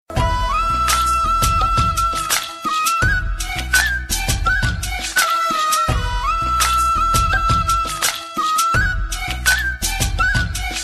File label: flute, ringtones; jazz